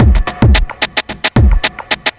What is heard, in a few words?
block rock kit